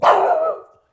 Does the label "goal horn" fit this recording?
No